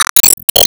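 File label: Battle Enemy Info Tap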